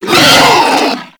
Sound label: Infested Charger Death; Warframe